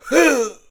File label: hit; SimplyGladiators